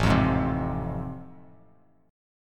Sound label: A Minor Major 7th Sharp 5th; strummed; AmM7#5; 5,8,6,5; JGuitar